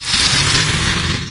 fireball create; doom3

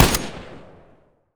g36c sil; weapons

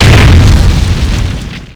step

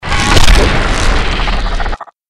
mirelurk attack; magmalurk; npc

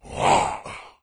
melee; doom3; commando

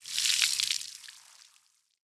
body bloodspout; flinder

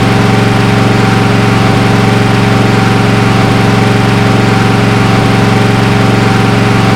v8 high, simulated vehicles

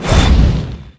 spider monster whoosh

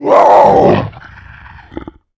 death

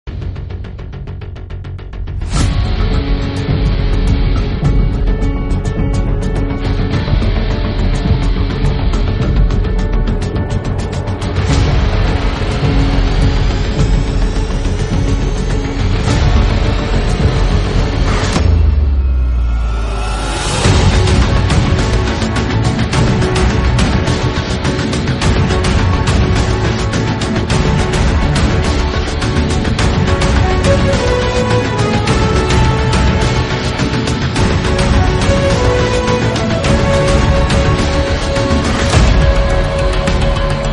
bgm

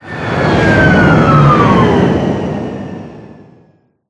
stop

